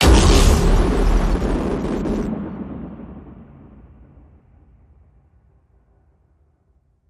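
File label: Hit Horror Repellent Noise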